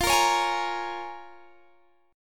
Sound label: Bb Minor 7th, guitar